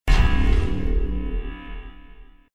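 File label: Background Lightning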